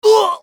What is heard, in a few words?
scav wound; eft